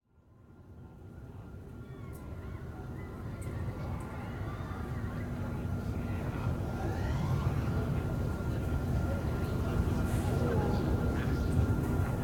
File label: amb market; ambiance; environment track; tatooine